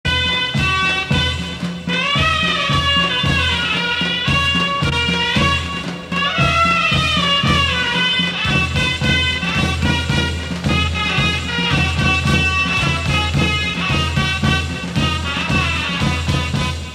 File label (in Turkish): mehter marsi; osmanli mehter marslari zil sesi